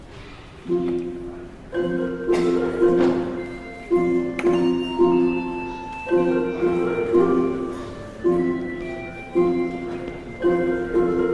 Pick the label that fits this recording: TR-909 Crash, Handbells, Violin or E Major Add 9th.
Handbells